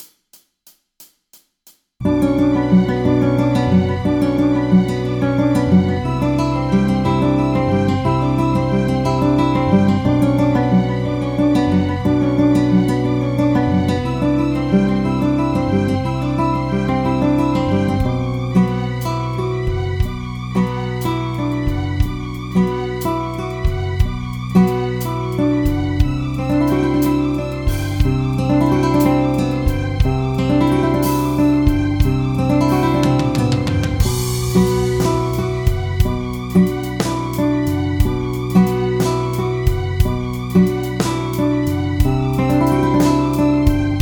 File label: guitar